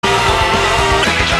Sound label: Bell Clinks